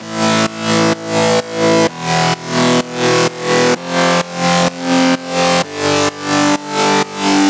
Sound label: synth 128 A; Electro; Anthemic; synth loops